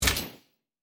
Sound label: sys metal menu